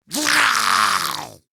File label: Spawn Grineer Ghoul; Grineer Ghoul Expired; Battle Barks